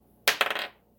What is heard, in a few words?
Die Roll